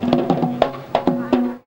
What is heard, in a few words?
PERC, India